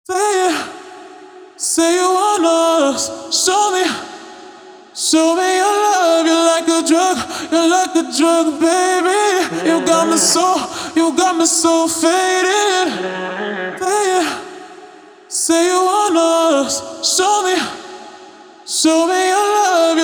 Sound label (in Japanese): ボーカル声変更